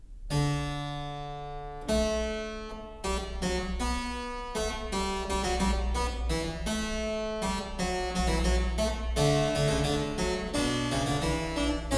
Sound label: Fugue, Well-Tempered Clavier, klavier; BACH; WTC 2; Fugue 7; BWV 876